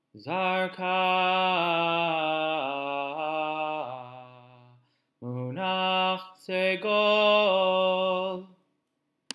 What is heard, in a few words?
Torah Trop Pattern